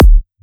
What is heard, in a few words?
Kick, Psytrance; Drum Shots